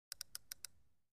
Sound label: Fishing Slow Clicks, fishing